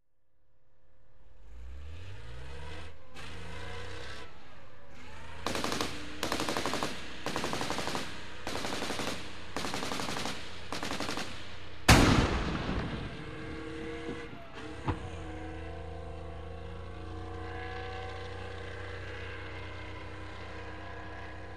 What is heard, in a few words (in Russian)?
dvizhenie tanka vistrel s pushki pulemetnaya strelba